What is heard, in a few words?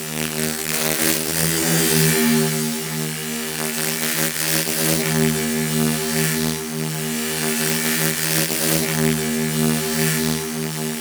Gas City Laser Loop; Warframe; Corpus Gas City; Gameplay Remaster; Ambience